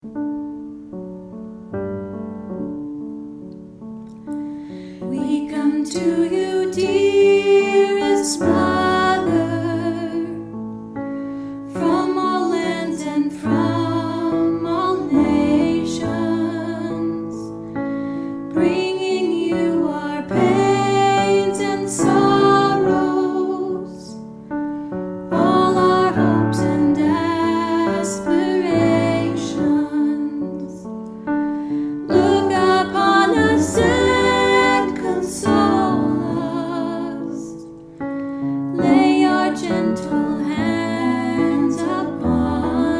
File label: Medjugorje Hymn